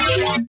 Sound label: weeee